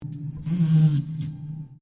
Piano Upright Broken String Slide Down Rubber; Upright piano without keyboard; Musical

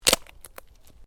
Ice: short crack of ice under pressure; Snow; Weather; Stuart Duffield

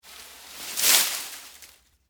Foliage Drop Move, Palm, Frond; Texture; Rustling; BlastWave

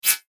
FLASH ELEMENT HIGH TECH

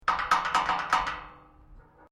GATE METAL LARGE CREAK, Large metal gate; Gates; Doors

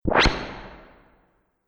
Multimedia Rollover, mouseover sound

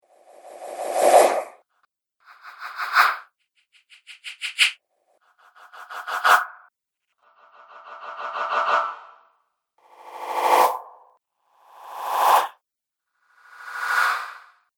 Strong Wind Whooshes; Whoosh Sound Effects

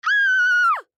Human Scream; Scream Short Element Girl; Blastwave

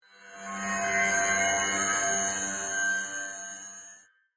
Sweep, Digital, Electronic, Transition; Production Element, Imaging Element, Accent; Sweeper; BlastWave

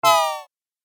Game App Hurt, Video Game Sound Effects

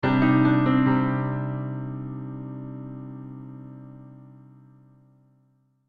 Piano Accent Minor; Musical; Blastwave

